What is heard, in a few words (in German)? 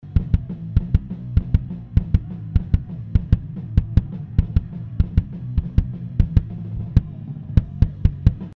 KickDrum Nature; mixing mastering